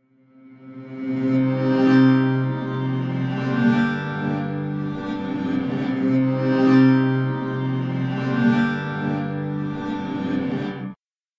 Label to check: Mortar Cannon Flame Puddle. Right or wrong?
Wrong